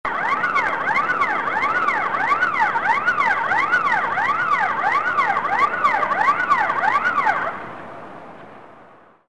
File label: siren